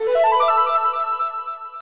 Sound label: magical